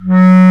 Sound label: WND CB Clarinet; Roland LCDP04 Orchestral Winds; Lo Clarinets